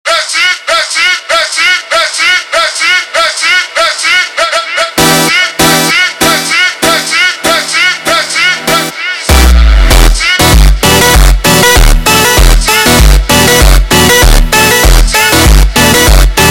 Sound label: super Slowed, funk